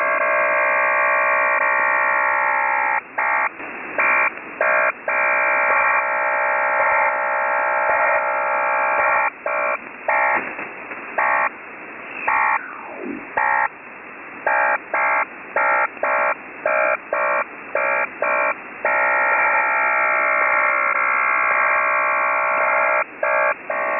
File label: Clover 2000 8 x 62.5 Bd BPSK-QPSK on 7001.5 kHz